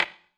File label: surface wood table, dice; darkwatch